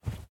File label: Cloth Run